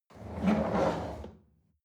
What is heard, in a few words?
chair wheel roll on floor soft